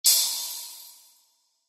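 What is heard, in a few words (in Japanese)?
氷魔法 小, 魔法効果音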